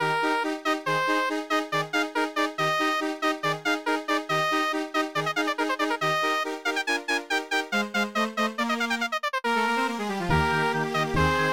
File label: midi trumpet song